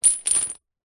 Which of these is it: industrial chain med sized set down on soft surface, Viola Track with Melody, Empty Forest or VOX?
industrial chain med sized set down on soft surface